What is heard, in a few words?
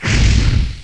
poof; Waterboy